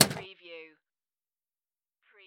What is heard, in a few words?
Pinball sound, clunk